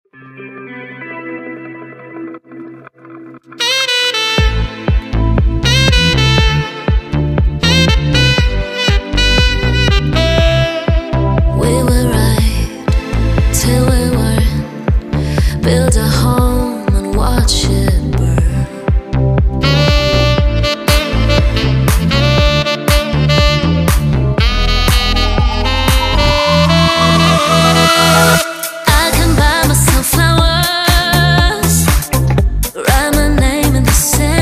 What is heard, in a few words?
sax voice